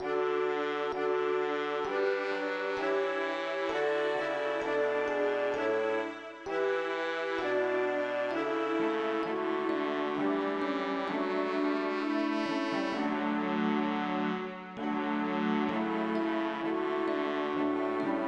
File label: CHORALE